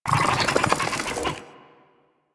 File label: Infested Fishing Fish Caught; Warframe; Infested Fishing Spear